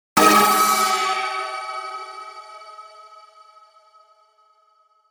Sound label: Hit Chopper Shimmer Delay; 5.1 STEREO MIX; Blastwave